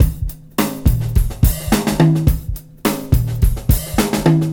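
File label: drum, breakbeat 106; Best Service ProSamples vol.24; AKAI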